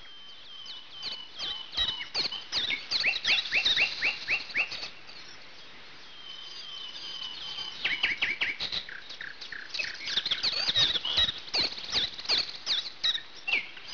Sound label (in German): waldvoegel; OpenBVE; Railway; SolyomvarRetro